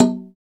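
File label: PER PROCU, Procussion, percussion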